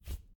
list hold sound; China Telecom

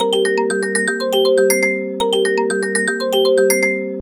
Clear, ringtones; China Telecom

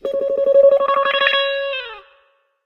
Wah Wah Beep; LG LS670 Optimus S; ringtones

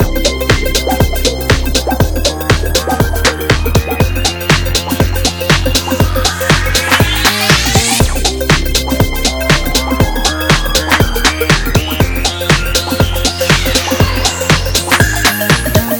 music club, ringtones; Samsung GT-i7680; cmcc

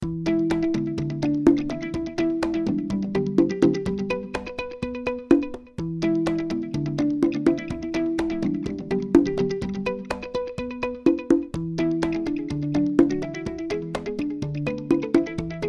alarms; Tecno Pop 9 4G; Confident

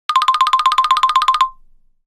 Xylophone, phonetones Nokia N8

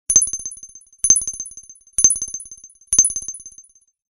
Ring Clinky; HTC Touch Diamond

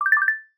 alert simple; Alerts and Notifications; Google; Material Design sounds

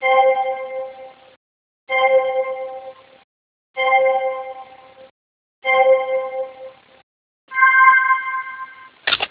count down 10

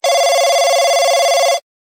Digital Ringtone; Huawei Honor 3

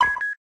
cam snap; LG Optimus One P500

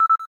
cam timer last; LG

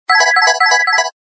Serene tone; Samsung SCH-R270 Chrono

